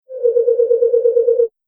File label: Laser Presence Beam, sci-fi laser sound effect